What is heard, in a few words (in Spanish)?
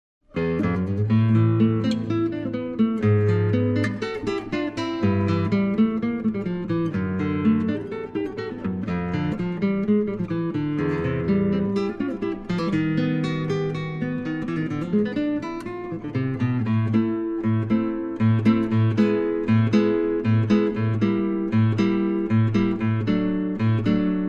guitarra sola